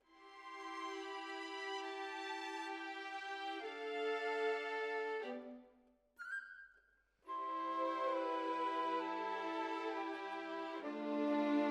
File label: Violins, jazz for piano